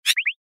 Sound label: Activate